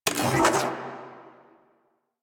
Arch Gun Mode Switch Alt, Weapons; Warframe; Archwing; Hildryn

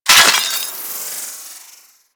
Loot Container Break A; Sentient

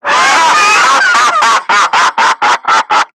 Screamer Self Destruct; Warframe; Lasrian

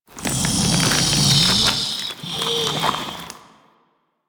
Hub Breath; Ambience; Props; Warframe; Infested Eris

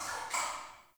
Cave Ocean Creature Close; Midrath Island

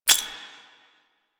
Sticky Bomb Pin Pull; Grineer; Veilbreaker; Vor

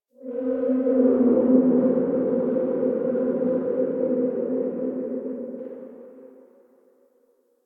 Eerie Drone; Warframe; Ambience; Nineteen Ninety Nine

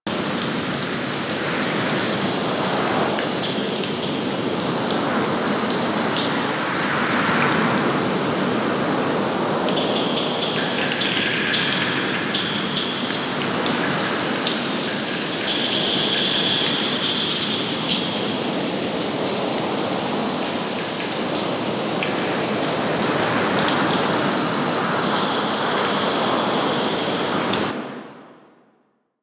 Forest Exterior Night Calm Amb; Warframe; Ambience; Grineer Forest

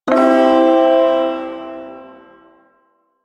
Ersatz Shawzin Maj Chord; Duviri

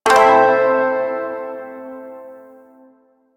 Shawzin Pent Maj Chord K, Music; Chords

